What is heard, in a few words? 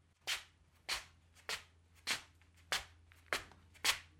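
shuffling sound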